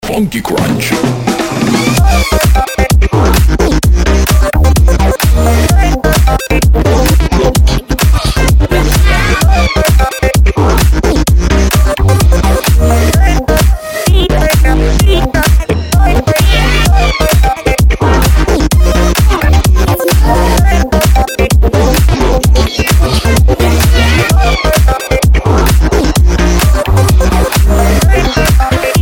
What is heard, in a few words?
Funky Crunch